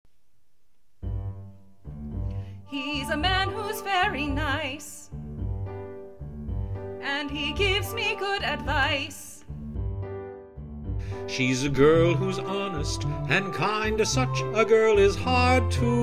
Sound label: One True Friend vocal